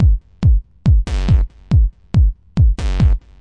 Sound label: 140 bpm, ruff edges, drum loops and breaks